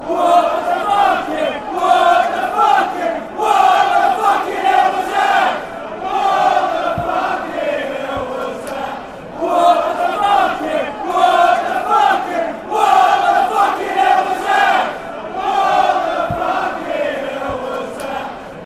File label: what, fanchants